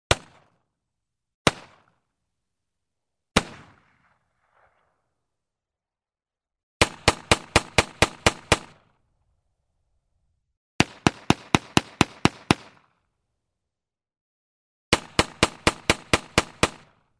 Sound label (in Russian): vystrely iz pistoleta